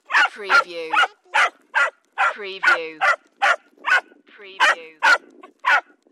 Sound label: Yppy Dog